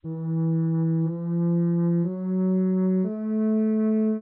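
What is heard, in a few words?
chromatic synt., Tetrachorde; genus chromaticum syntonum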